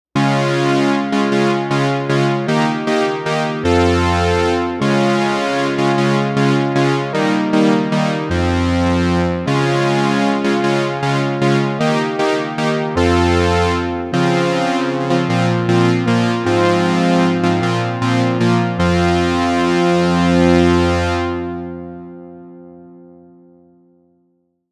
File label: Epic Razor Chord